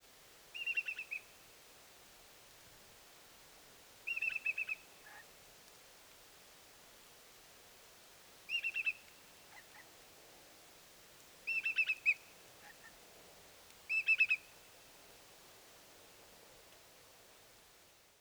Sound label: Whimbrel and Tawny Owl